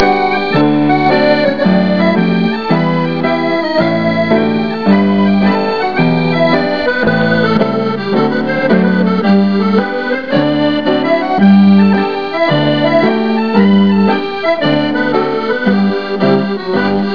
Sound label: HORNPIPE